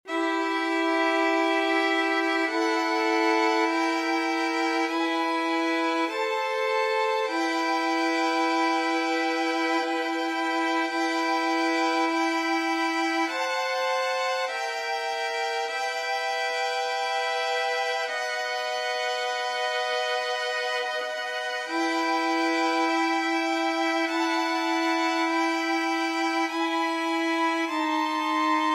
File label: aequale VLN TRIO; bruckner